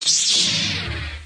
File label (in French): sabre laser, Allumages Sabres laser; effets speciaux